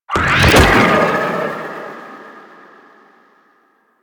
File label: Staff Jab; Warframe; MonkeyKing